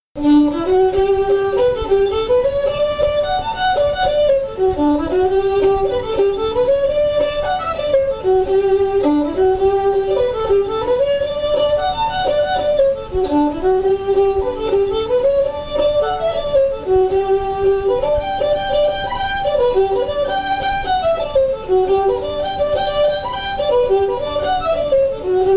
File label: jig, fiddle; killimor